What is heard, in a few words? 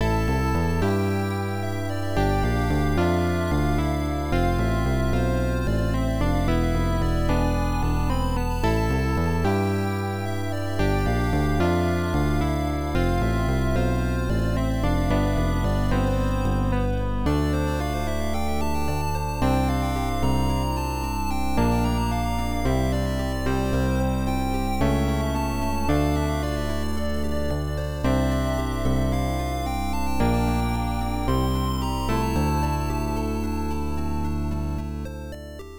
Defeat; Jinn Eien no Yuushi; pc-98